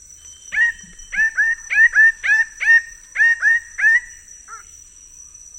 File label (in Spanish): Paradisaea rubra sonidos